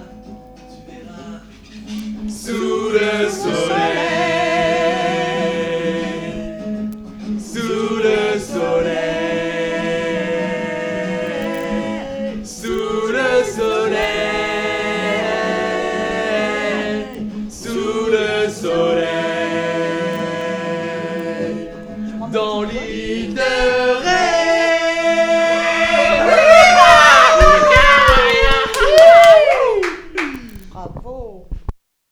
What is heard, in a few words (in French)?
choeurs